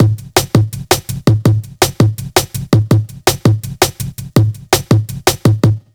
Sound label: 165 break, Explosive Jungle; USB Soundscan; AKAI